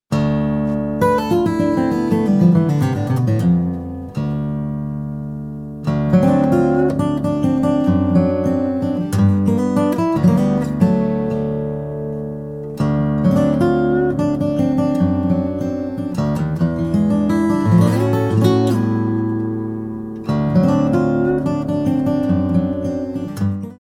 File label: Chicken Blues